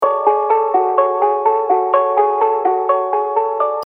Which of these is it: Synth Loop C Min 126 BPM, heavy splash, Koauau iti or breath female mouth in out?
Synth Loop C Min 126 BPM